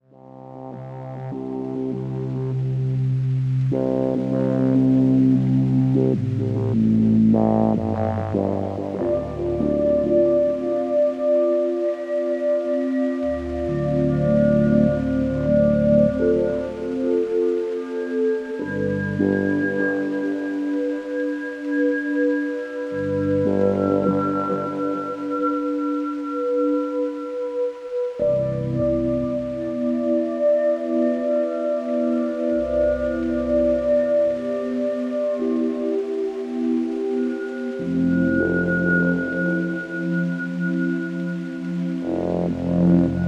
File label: Rain